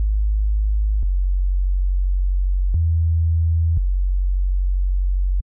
B 175bpm bass

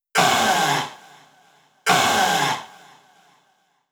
140 CHANT LOOP

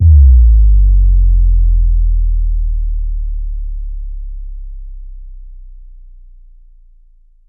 Sub Drop FX

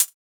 Shaker

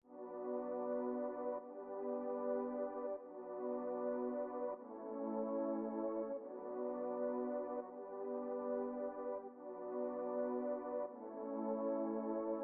D 152bpm pad; Bleu Moon 7

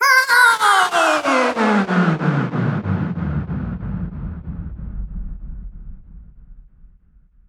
Sweep Down Vocal

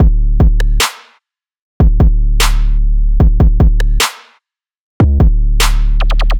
150 Heavy Trap Beat

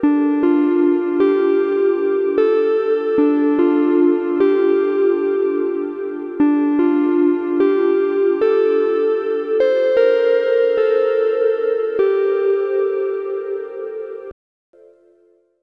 Synth Rhythm 75bpm D Lofi Chillout

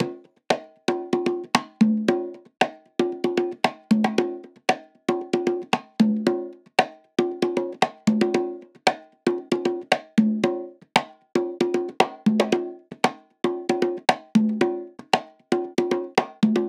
115 Congas Loop

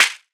clap thinner

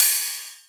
RIDE; KULTURE

